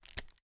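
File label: charge reload fast end, pistol; gmod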